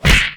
punch; gyakuten touhou

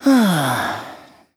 sigh; nagito

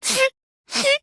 cry

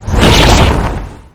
damage

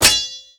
metal

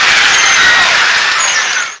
crowd goes while

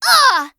hit; tressa

